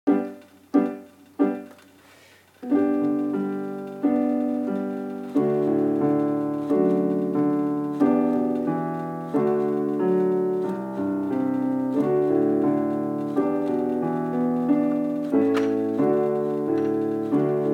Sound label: piano kara